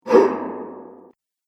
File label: Strong Air Whoosh